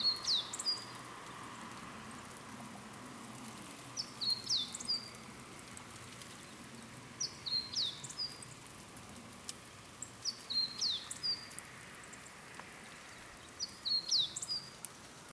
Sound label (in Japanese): hoojiro, okunikkou; senjou